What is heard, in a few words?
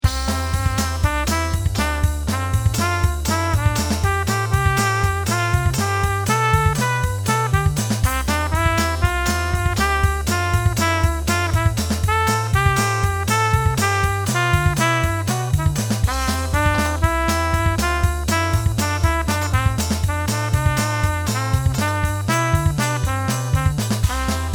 melody ska